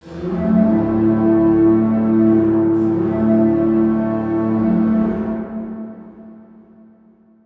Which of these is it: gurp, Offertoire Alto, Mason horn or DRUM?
Mason horn